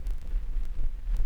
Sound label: VINYL, 145 BPM; Ueberschall; Drum & Bass Resonance